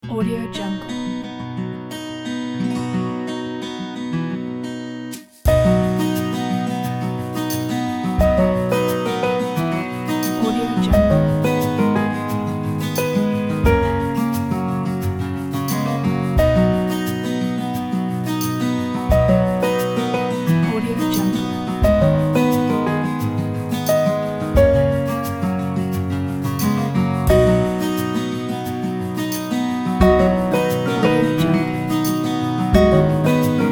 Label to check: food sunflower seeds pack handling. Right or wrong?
Wrong